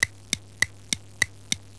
TIC TOC

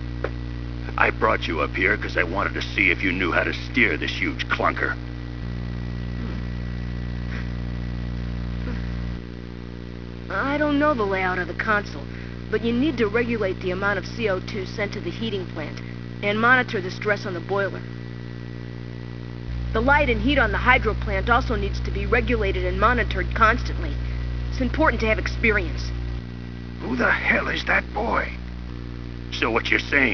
pain

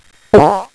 fart

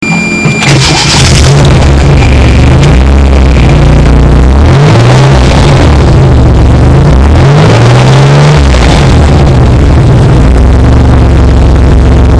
Startup Inside, maserati 228

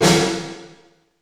snare all wet alesis

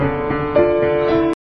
piano

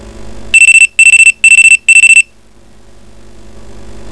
phone

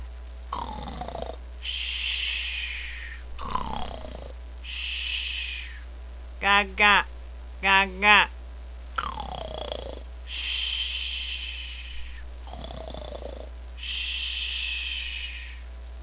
Baby sleep

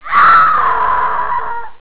Woman Scream

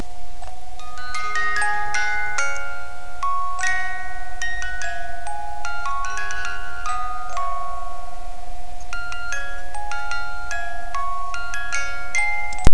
baby sounds

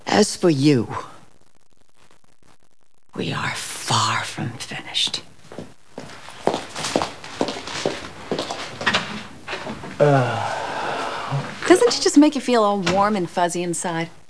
warm n fuzzy

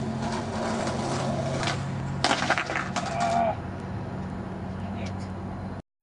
fall

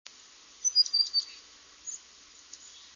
chickadee; birdsongs

